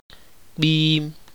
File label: sound of a short blast on the horn of a small auto; ibibio